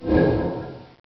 infuse

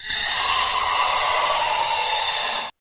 harpy

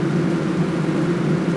foxfire

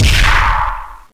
punch

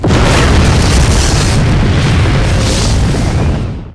firestorm

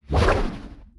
tentacle

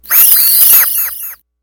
die girl

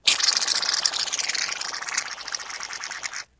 spider

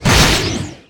wind cut

